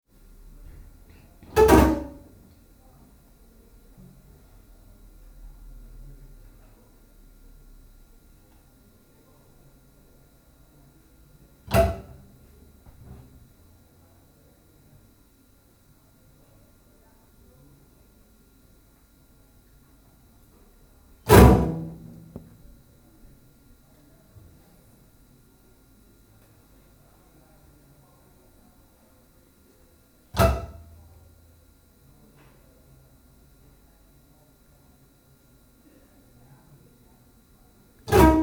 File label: TREE bass